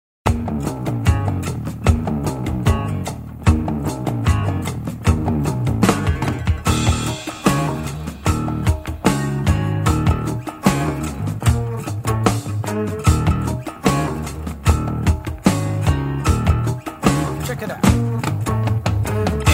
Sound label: Blues